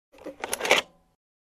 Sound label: Vintage Camera Shutter Sound